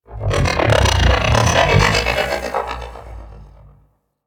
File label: Arachnoid Camper Leg Whoosh; Warframe; Corpus